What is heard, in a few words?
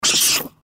giant rat attack; npc